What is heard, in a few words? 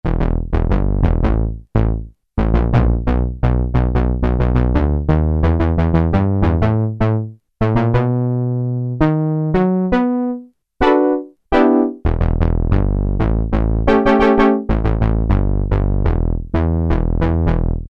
Synthe Bass, Korg Poly-800